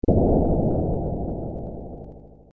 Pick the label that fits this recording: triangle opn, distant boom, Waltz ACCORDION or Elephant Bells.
distant boom